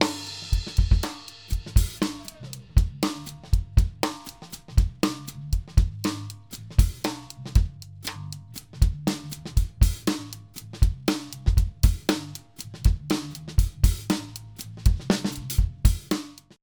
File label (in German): Einzelspuren BD SN OH Rock DW Rock Bus